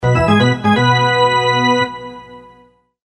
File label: charge fanfare; Bugle Call - Horse Races